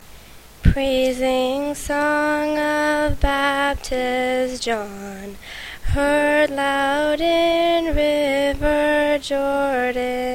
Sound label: procession song english